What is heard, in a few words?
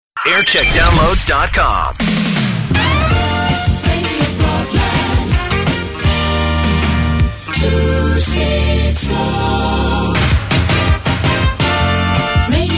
Jingle Montage; Radio Broadland Norfolk